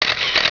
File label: camera